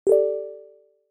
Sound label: ping